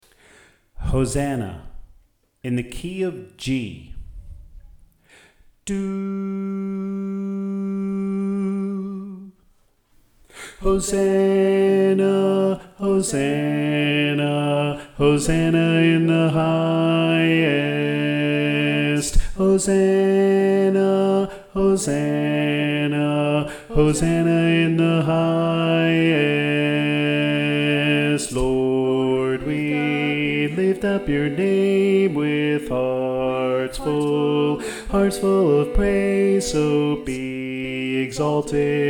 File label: Bass